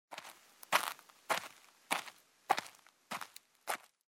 Footsteps; Age of Empires III; cinematics

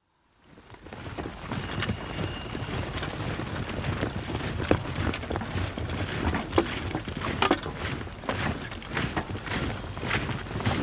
Ambient; Microsoft Studios; Age of Empires III - Complete Collection; cinematics

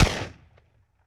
Benelli 12 Gauge Shotgun Blast; Weapons & Firearms; Sony Pictures Sound Effects Series

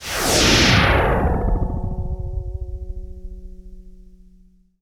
Zap Synth, Sound Design - Sci-Fi; Sony Pictures Sound Effects Series Volumes 1-10; Sony Creative Software

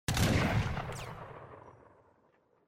Muskets; Age of Empires III; cinematics

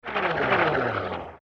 Cannonball Incoming; Period Combat; Sony Pictures Sound Effects Series

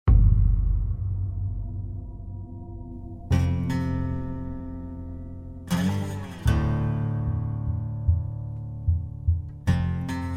Music

